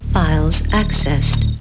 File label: message tones; ringtones; sms